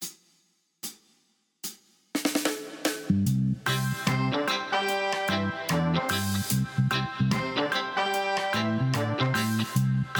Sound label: Vocal